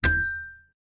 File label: bell puzzle